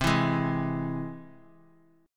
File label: C Augmented down; strummed; JGuitar